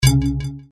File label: dislike